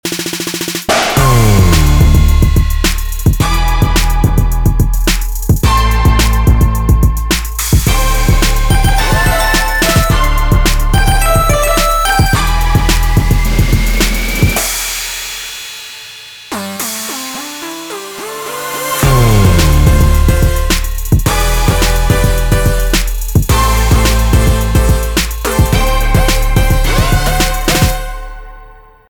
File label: modern instrumental